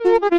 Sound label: Quick Little Thing Transition